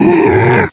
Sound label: Clot Fear